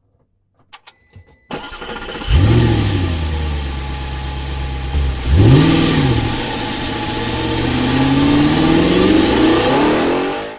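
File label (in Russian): avto zavoditsja i uezzhaet, car starts and drives away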